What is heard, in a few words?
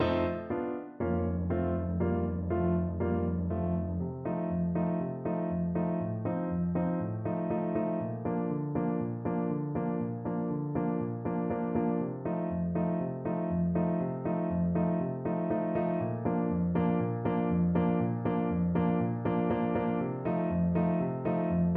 euphonium